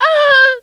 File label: woman hit; Mount&Blade Warband